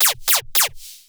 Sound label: fx brostep laser